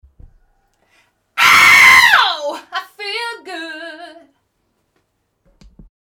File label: Scream